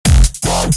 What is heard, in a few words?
Dubstep